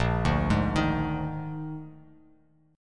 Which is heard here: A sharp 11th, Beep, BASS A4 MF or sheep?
A sharp 11th